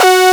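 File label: brass G4, SYNTHESIZER analog brass; AKAI S6000 CD-ROM - Volume 1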